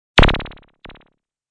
Squiggle; Squiggles

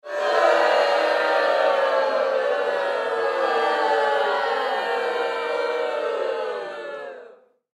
Human Crowd Studio; Crowd Reactions Boo Loud Multiple; Blastwave

